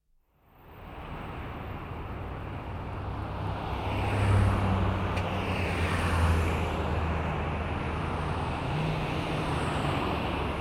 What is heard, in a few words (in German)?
Grossstadtgeraeusche Starker Verkehr